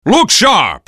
attack; Dota 2; kunkka

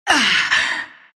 pain, ru; Dota 2

